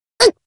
Hurt, voice commands Anime Loli; svencoop

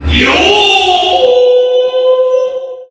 yoooo; svencoop

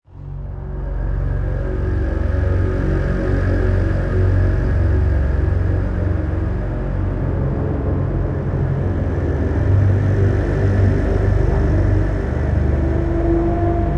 dark wave horror; svencoop; cards

